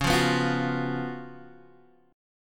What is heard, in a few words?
C sharp 11th C sharp, Guitar, Open G